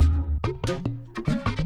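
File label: Music Dpad Base 145; from dust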